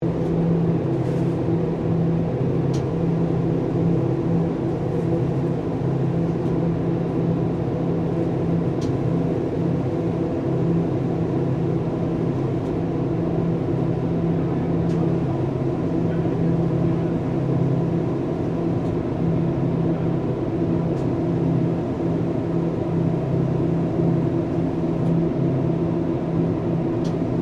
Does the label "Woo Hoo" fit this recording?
No